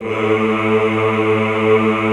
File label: EHH A1, Classical Choir; Best Service